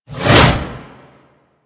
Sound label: Scythe Whoosh; Stalker Scythe